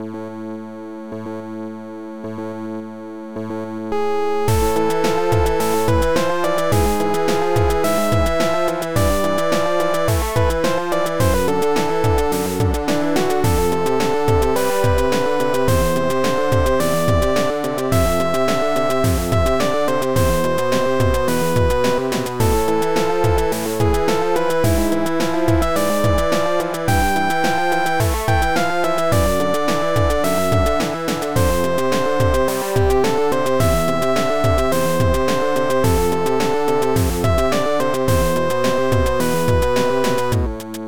Internal Percussion

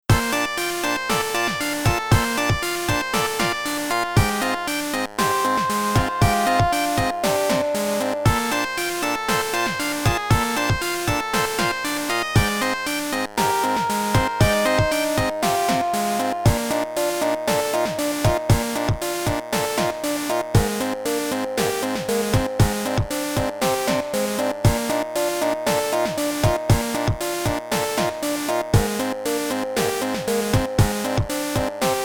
Internal Percussion

